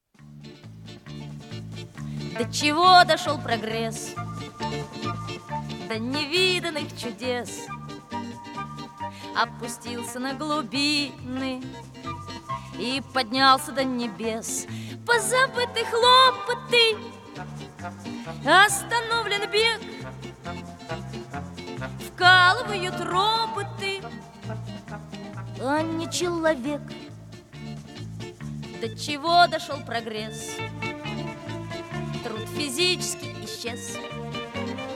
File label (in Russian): Большой детский хор